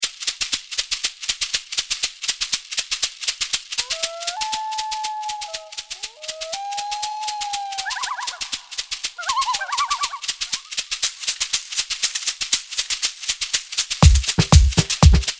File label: loon techno